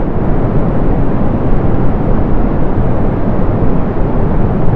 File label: hovercraft thrust loop; minetest; vehicle mash